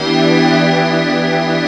XCH 17 -7, HOUCEY CHORD; AKAI S6000 CD-ROM - Volume 1; SYNTHESIZER

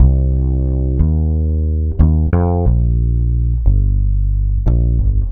Vintage Fretless, Electric Bass

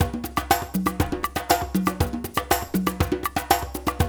percussion loop; InVision Interactive; Percussion Slam; PROGFROG

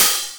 Open HH, Cymbals

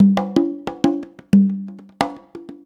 90 CONGA, PERC

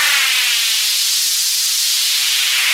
Sweep loop, Roland JX-305

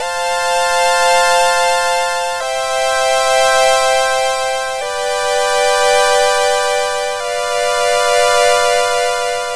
C64 Pad

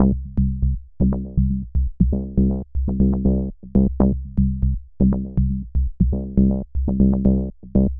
Bassline, Prototechno; Sonic Foundry (Sony Creative Software); Basses

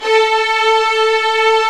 STR SW VLN, Vlns 8 fff; Roland - String Master Series

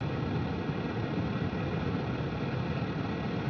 Ambience Loop, outdoor

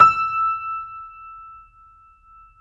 JAZZ E5, Keyboards Acoustic; USB Soundscan vol.17

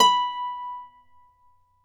Celtic Harp, Plucked Strings

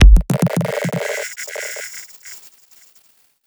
Lat, Kik, One Shots

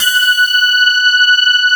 sparkles

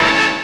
DR. LOOP; X-Static Goldmine 3; CHORDS

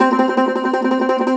cumbus saz C, plucked strings; E-MU Producer Series Vol. 7 – Old World Instruments

